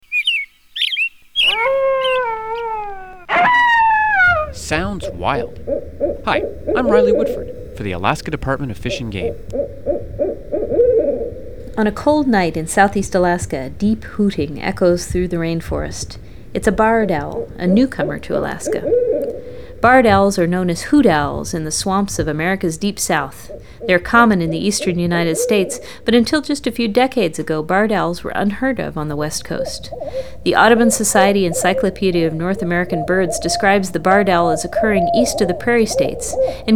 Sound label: barred owls